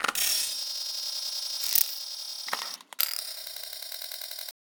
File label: interface glitch interaction data; sonniss gdc